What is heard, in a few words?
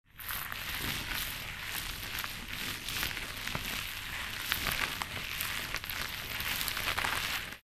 steps on stony path